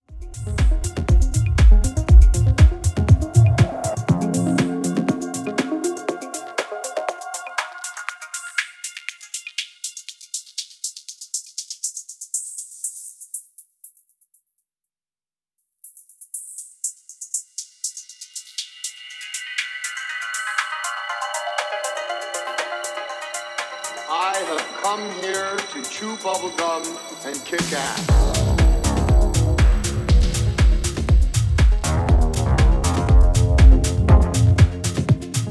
HP mittel Reso

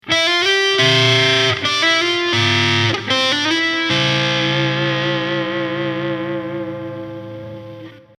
Sexten verzerrt momentary

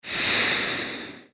Vocal Special Plopp atmer überzogen eingeblendet

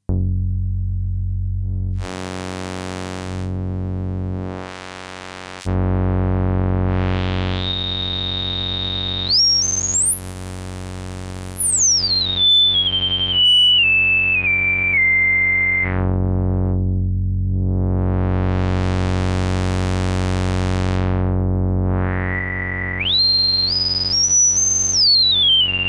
Cutoff hohe Resonanz

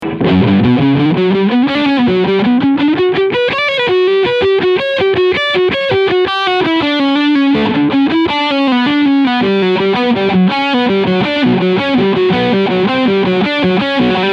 L7 TBL Leadsound Steg PU; Larry Carlton

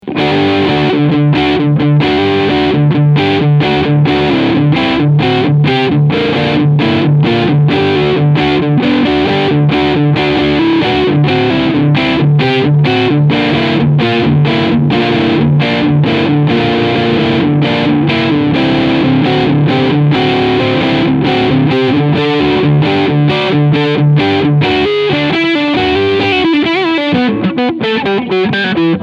H 150 VCSB Crunch Sound beide PUs, guitar; Heritage Guitar

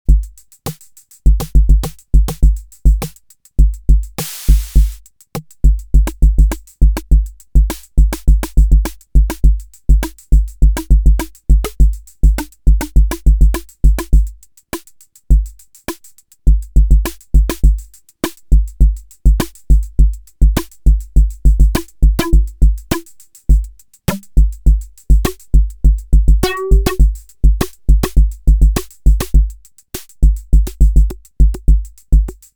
Percussion Modul

